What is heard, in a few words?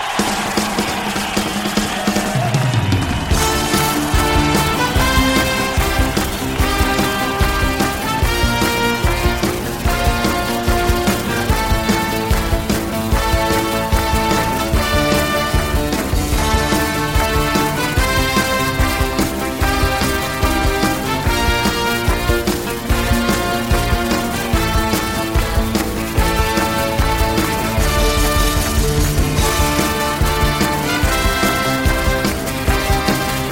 Final Instrumental (Live); Palais des Sports 1982